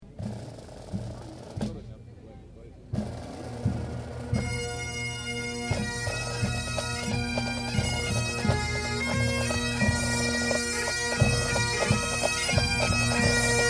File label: bagpipe